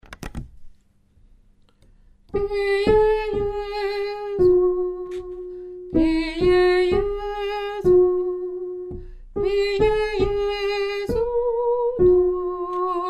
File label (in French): lettre B soprano; chorale